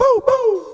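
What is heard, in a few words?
vocals, Roots Disco; USB Soundscan vol.32; AKAI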